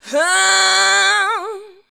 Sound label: "aauu", shouts; Best Service; Hyper Dance